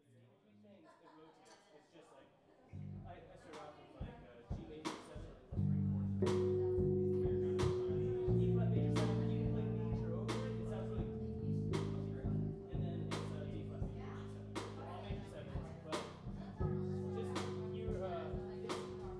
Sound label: Oboe Rain; Electric Jam